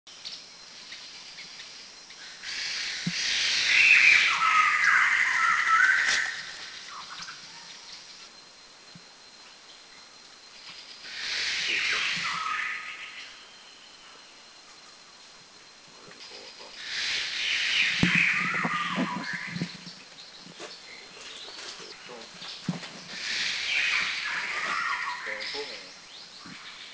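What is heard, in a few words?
White-crested Laughingthrush; Kaeng Krachan National Park; Thailand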